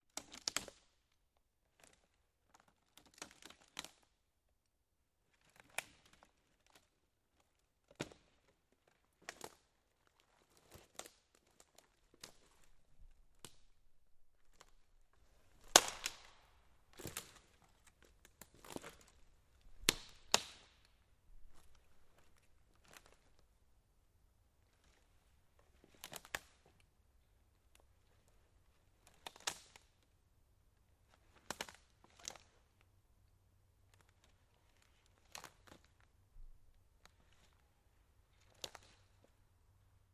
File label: Footsteps in forest branches sticks wood